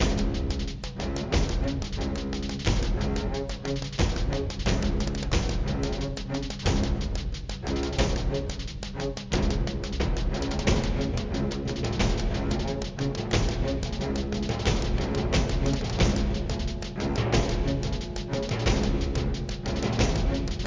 Boss Music; crouching pony hidden dragon

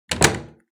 Door close; Devour

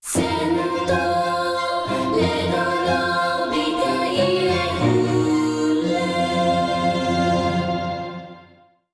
Song of Wind; Popo; Stella Glow

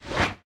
SFX - Whoosh Wide Slow Stereo; Smashy Road Wanted 2 android ios switch gamerip 2020

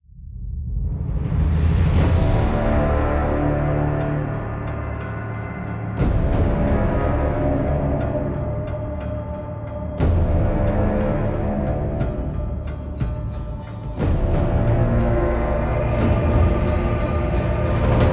Final Confrontation Loop; The Witcher 3 Wild Hunt; Q605 Final Confrontation Stage 02

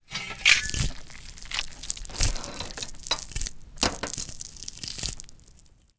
Tortures Toolbox; This Is the Police 2

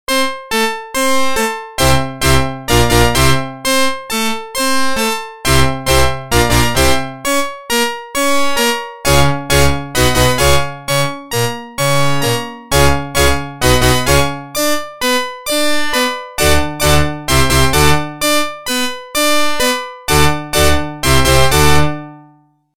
Organ; NHL 94

